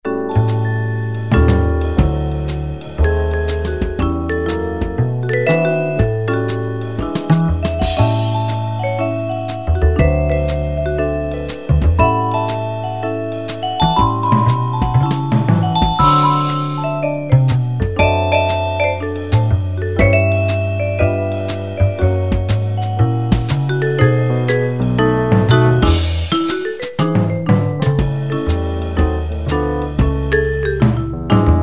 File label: Vibes, Generalmusic pRP8; Factory demos